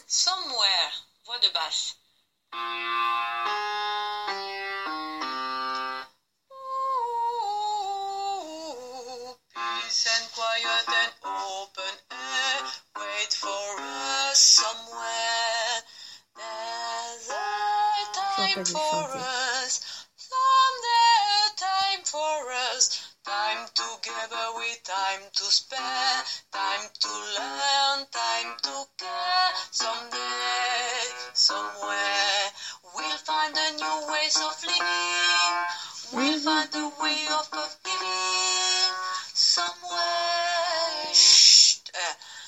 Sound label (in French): voix de basse